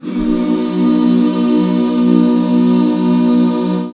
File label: B 6, back chords; Sound & Vision - Gigapack II CD 1